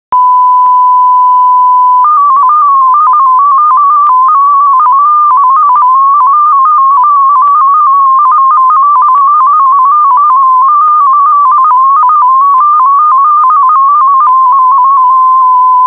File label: mode MFSK16 FEC